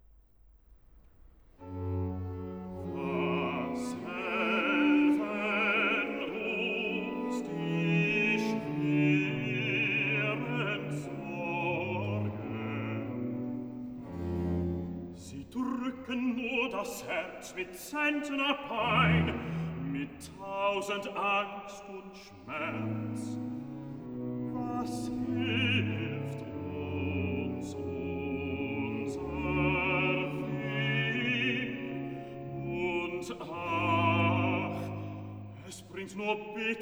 Cantata, Choral e Recitativo; BWV 93 'Wer nur den lieben Gott lässst walten'; Bach; Mühlhausen; 5th Sun After Trinity

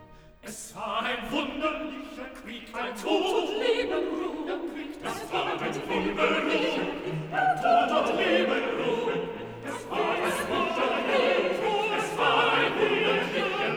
Coro; Cantata, BWV 4 'Christ lag in Todesbanden'; Versus IV; Bach; Eisenach; Easter Sun & Mon

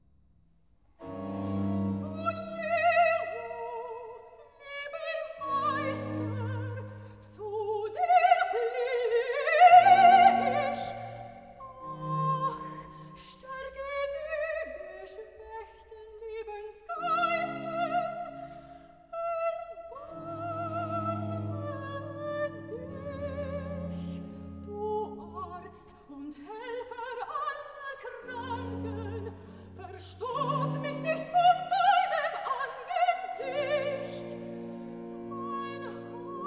Cantata, Recitativo (Sopran); BWV 25 'Es ist nichts Gesundes an meinem Leibe'; 'O Jesu, liebe; Bach; Ambronay; 14th Sun After Trinity

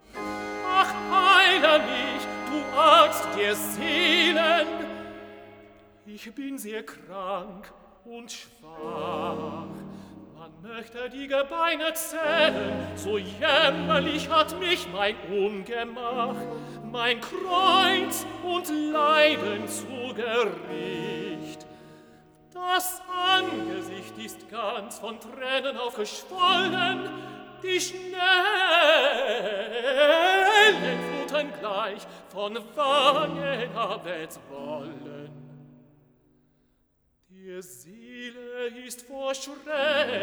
Recitativo (Tenor); Bach; Zurich; 3rd Sun After Trinity; Cantata, BWV 135 'Ach, Herr, mich armen Sünder'

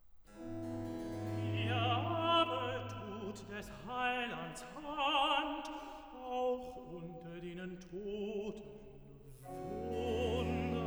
Cantata, Recitativo (Tenor); BWV 48 'Ich elender Mensch, wer wird mich erlösen'; V. Recitativo (Tenor) 'Hier aber tu; Bach; Potsdam; 19th Sun After Trinity

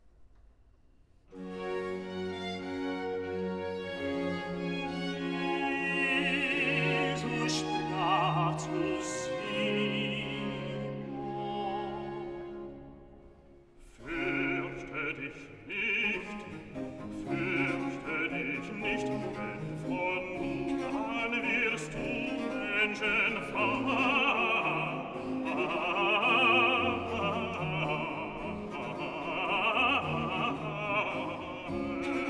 Cantata, Recitativo; BWV 88 'Siehe, ich will viel Fischer aussenden'; 'Jesus sprach; Bach; Mühlhausen; 5th Sun After Trinity

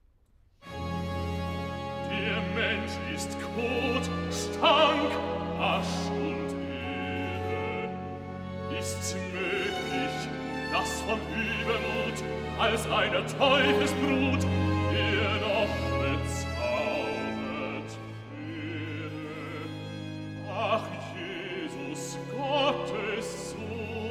Cantata, Recitativo; BWV 47 'Wer sich selbst erhöhet, der soll erniedriget werden'; Bach; Lund; 17th Sun after Trinity

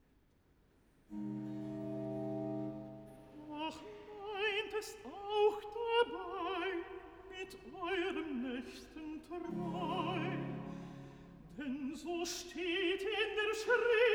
Cantata, Recitativo; BWV 169 'Gott soll allein mein Herze haben'; VI. Recitativo 'Doch meint es auch dabei'; Bach; Leipzig; 18th Sun After Trinity

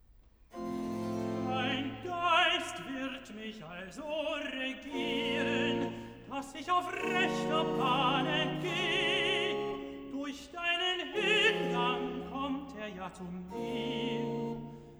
Recitativo (Tenor); Cantata, BWV 108 'Es ist euch gut, dass ich hingehe'; Bach; Warwick; 4th Sun After Easter

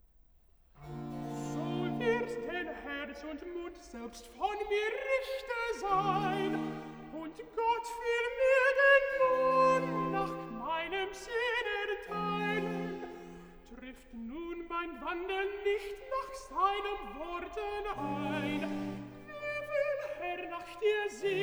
Cantata, Recitativo; BWV 45 'Es ist dir gesagt, Mensch, was gut ist'; Bach; Rendsburg; 8th Sun After Trinity

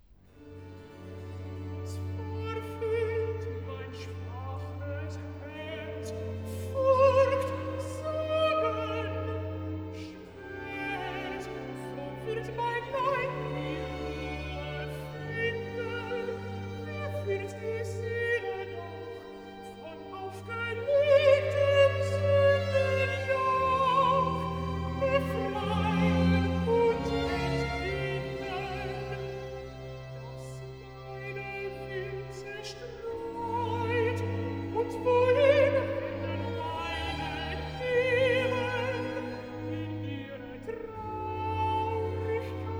Cantata, Recitativo (Alt); BWV 8 'Liebster Gott, wenn werd ich sterben'; Bach; 16th Sun After Trinity

